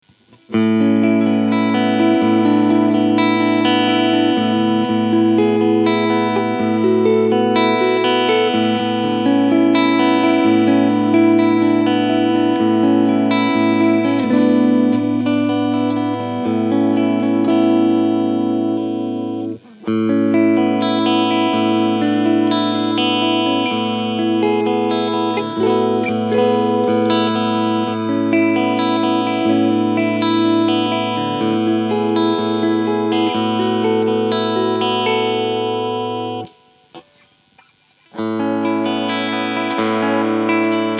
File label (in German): Clean alle 3 Positionen